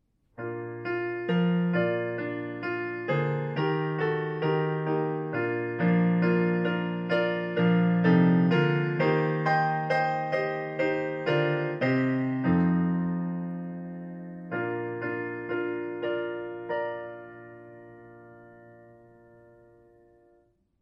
Chord soundings

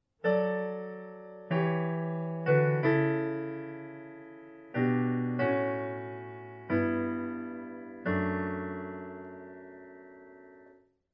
Joy to the World parallel thirds

